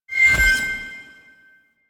Shard Ephemera Hide, UI; Warframe; Archon Shard

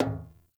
Walk Metal, Footsteps; Characters; Enemy; Mockeries

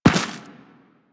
Run Dirt, Footsteps; Warframe; Teshin

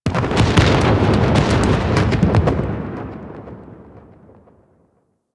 Ship Exploding; Warframe; Protea Quest

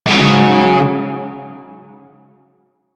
Shawzin Yo Chord G; Music; Warframe; Narmer Shawzin

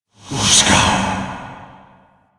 Whispers; Warframe; Tenno; Grimoire

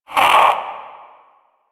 Possessed Vocal Pain; Enemies; Warframe; Priest Quest; Possessed Red Veil

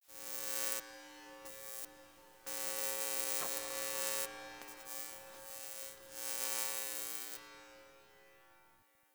Fluorescent Light Flickering One Off; Warframe; Ambience; Entrati; Dante Unbound Leverian

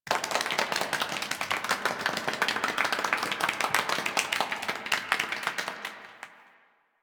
Theatre Crowd Applause; Duviri

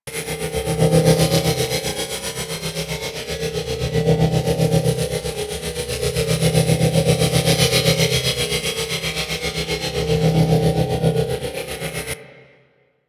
Railjack Energy Wheel Loop; Warframe

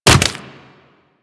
Sword And Whip Finisher Puncture; Warframe

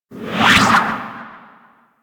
Grapple Pull; Warframe; Warframes

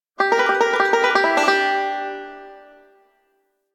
Spear Fishing Banjo; Warframe; Weapons; Eidolon Fishing Spears

